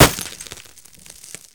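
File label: Weapons ricochet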